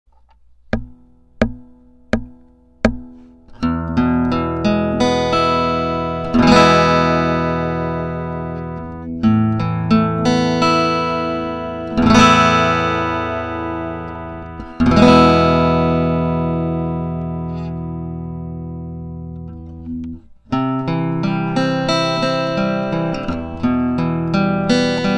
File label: big rev effect; ピエゾ大リバーブ